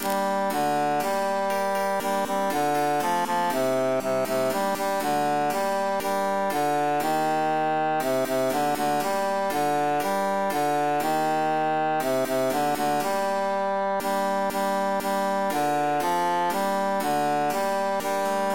bassus emphasized; la la la

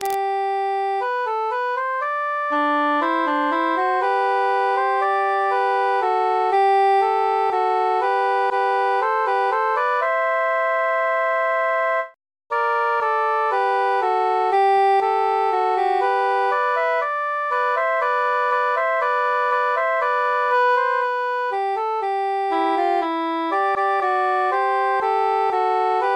how merrily tenor mute

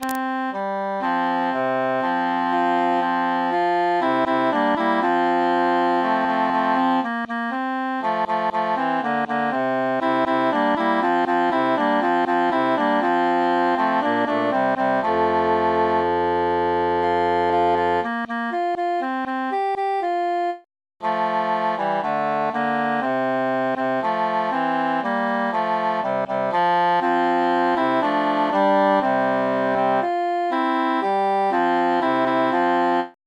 cantus mute; psallite unigenito

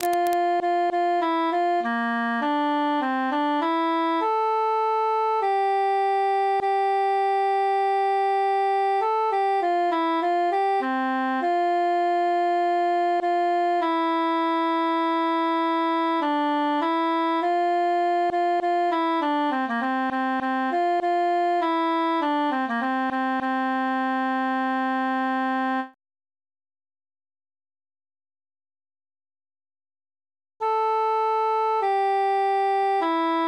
altus solo; en natus

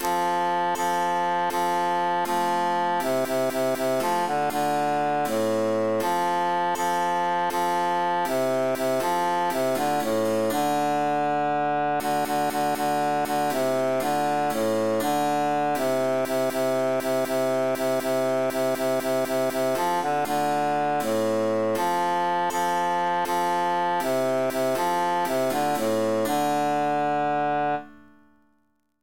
bassus emphasized; pase el agoa

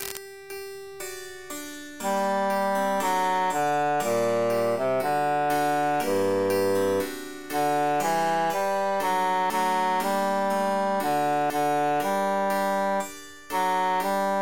bassus emphasized; adew sweet amarillis

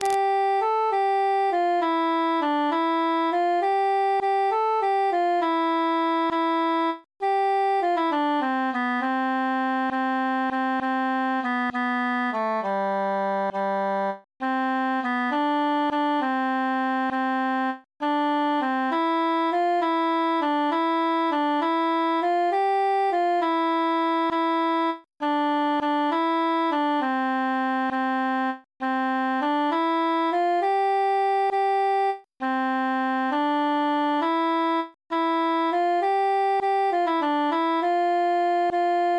cantus solo

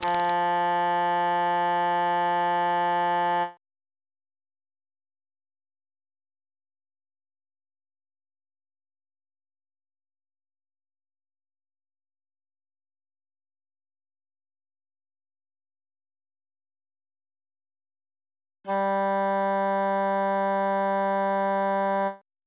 tenor solo; organum alleluya

